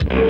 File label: A Chunk, Electric Guitar; Sonic Foundry (Sony Creative Software) - Friendly Fire